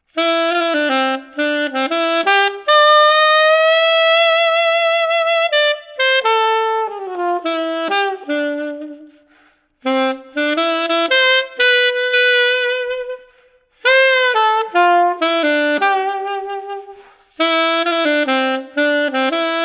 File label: Metal Alto, alto saxophone mouthpiece; BS Burnin